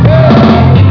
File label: Mine Appears; Maelstrom Simpsons